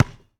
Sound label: Street Ball Bounce Concrete